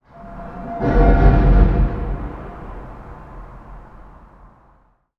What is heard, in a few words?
random metal drop, factory sounds; svencoop; mustard